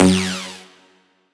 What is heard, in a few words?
Riddim Synth Hit F#; One Shots; Subtrakt; Ghosthack; Riddim Essentials 2020